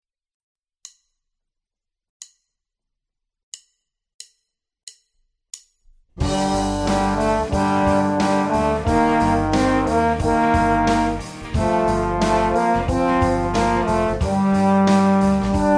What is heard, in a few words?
Rock Heavy (duet)